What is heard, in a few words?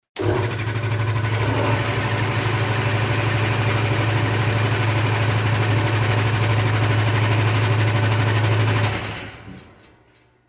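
compressor switching on and off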